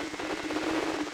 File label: flutter